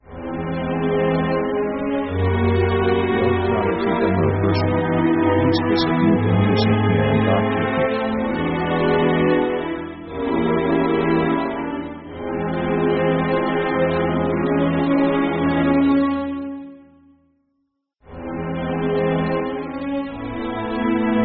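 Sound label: orchestral dramatic walz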